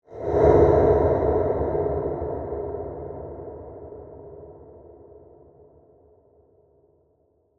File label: Horror Swell Gust, Eerie; Swell Sound Effects